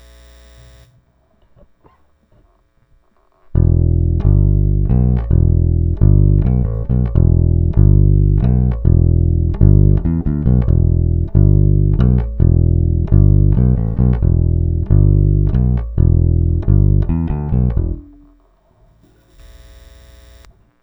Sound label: bass